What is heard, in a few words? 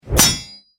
Sword clash impact metal clang